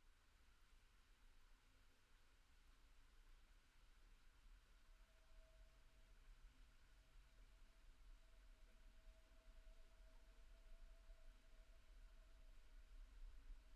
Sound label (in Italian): in transito Centovallina Galleria; rumore lontano